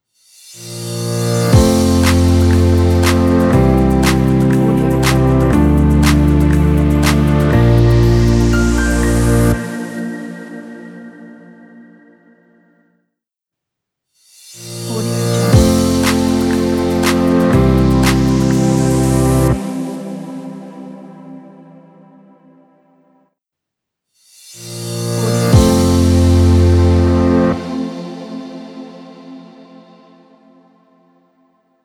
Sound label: inspiration motivate upbeat logo